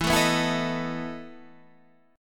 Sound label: E Major 7th Suspended 4th, Guitar Standard, EM7sus4 chord; strummed; JGuitar